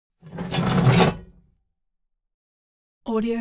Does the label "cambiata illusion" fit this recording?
No